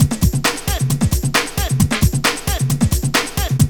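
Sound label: drum loop; X-Static Goldmine 3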